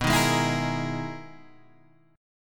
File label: E Major Sharp 11th B